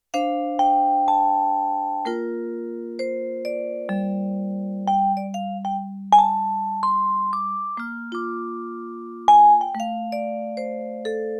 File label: Non-Tremolo, Vibraphone